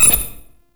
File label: Flying Robot Beep